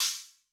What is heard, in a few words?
China, Cymbal; Yamaha DTXpress-II